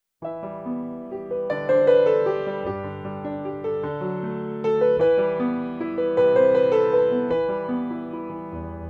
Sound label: Piano Sample